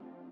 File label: lead